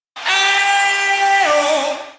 EeOo

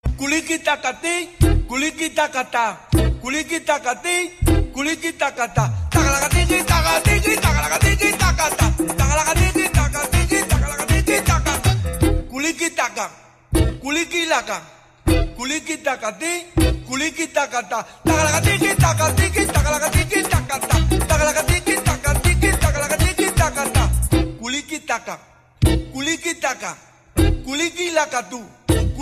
Caribe Funny

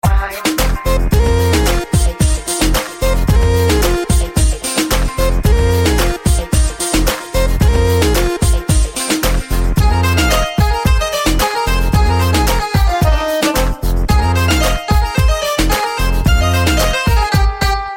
Music

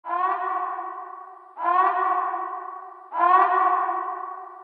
Alarm; Overwatch

